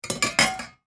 Household Pots Movement Pull Out From Cupboard; Kitchen

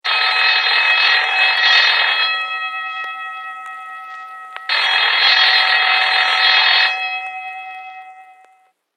School Bell Vintage; Bells; Musical

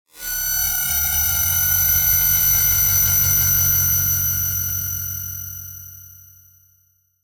Electronic High Frequency Warble; Production Element Imaging Element Accent Transition

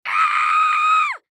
Human Scream; Scream Ah High Pitch Male Twelve Yrs Boy; Screaming

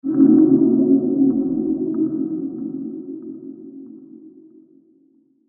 Horror Ambient Pad; Scary Soundscape Sound Effects

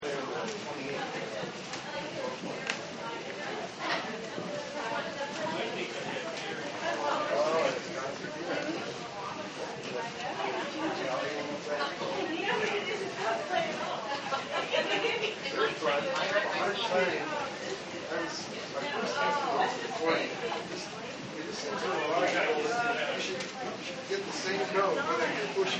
People Walking Talking; Crowds; Partners In Rhyme

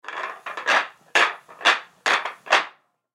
Pool Rack Slide; Pool Rack Slide Back Forth; Sports

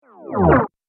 Multimedia Rollover, mouseover sound

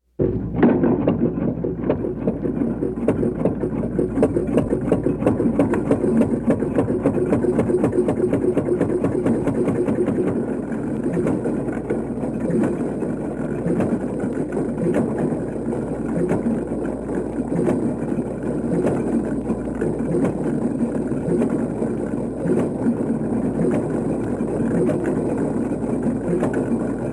Cement Mixer; Transportation (Sound Effects:Backgrounds)

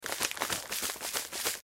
Sugar Bag Shake, Sugar Bag Shake Food Salt; Household; Blastwave

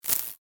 Very Faint Tremble Quick Whoosh; Whoosh Sound Effects; swish, sweep and swoop sounds; Andrew Fleming

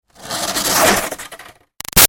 Snow Scrape Shovel By Gritty; Yard Tools; BigRoomSound

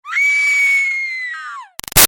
Scream Female Terrified Shrill; Voice; Screaming; Big Room Sound

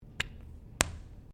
GUN TOY DART STICK POP; Pops; Objects

